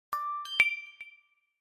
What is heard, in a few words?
Cell phone notification